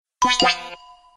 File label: gameover; CounttheCards; Fun games